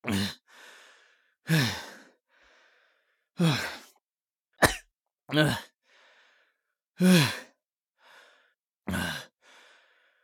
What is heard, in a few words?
breath hurt; Escape from Tarkov